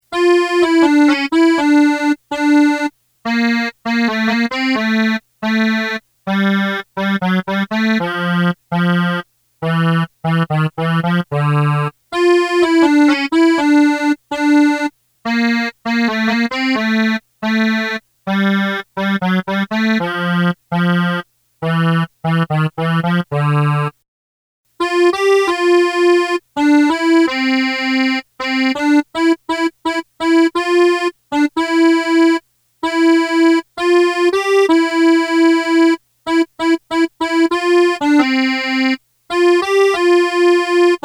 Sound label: melody; southern songs